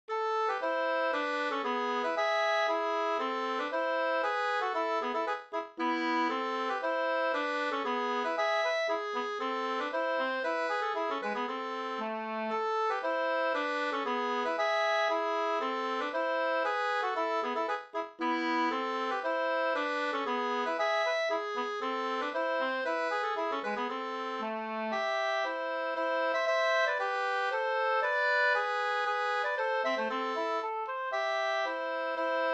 polska

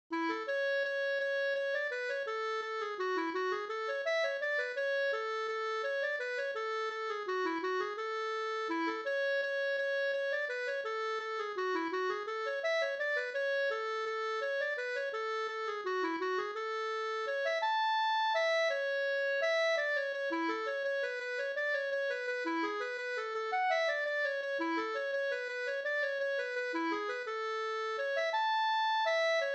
vals A dur; Hammarbergs-Hans

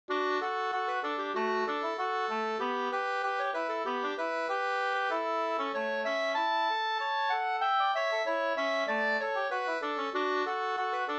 snoa

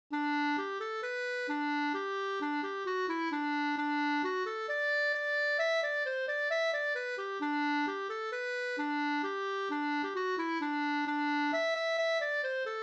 schottis lybeckare

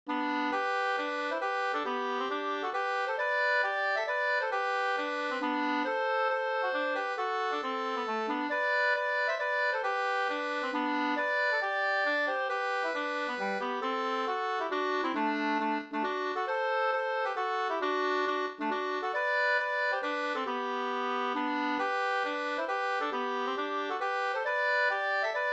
hambopolska, 2st

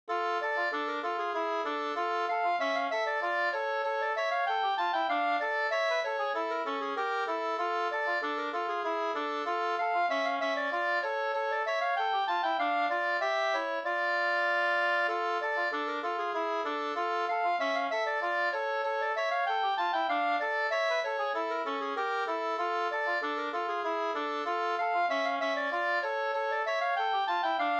polska, 2st; Bytt-Lasse SvL HG45

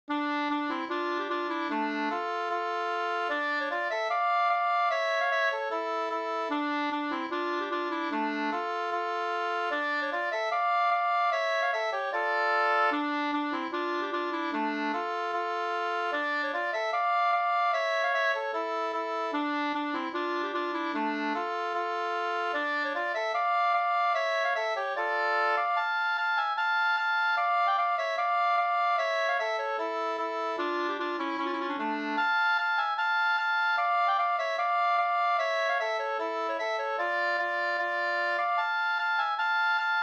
schottis; Havero

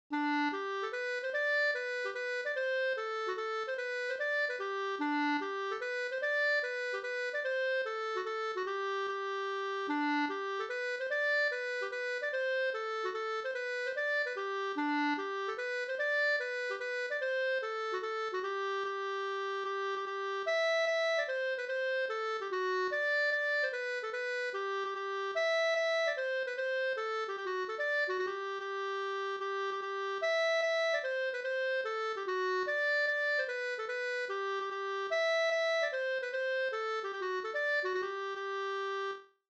hambopolkett Gdur

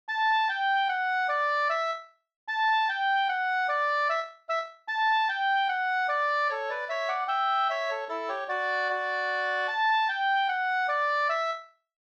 mazurka, 2st; Vavmazurka fr Bygdsiljum av Thomas Andersson

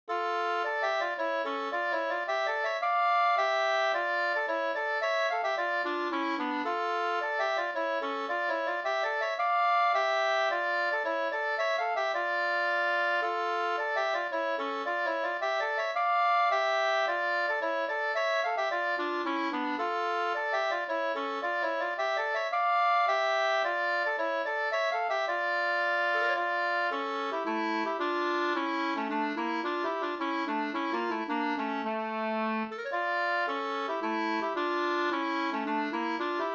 polska, 2st; David Aslin e Ernst Annell

